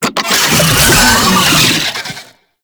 Summon Vocal; Warframe; Uriel; Catenach

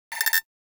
Rifle Firing Stopped Mech; Weapons; Warframe; Corpus; Ruban

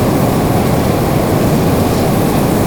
Rocket Launcher Proj Loop; UT Weapons

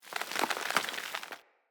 Squid Plant Uproot; Traps

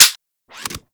Fire 2D Mech, Rifles; Warframe; Weapons; Snipetron Vandal

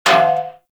Bullet Impact Debris Pipe; Warframe

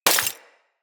Bullet Impact Debris Glass; Weapons

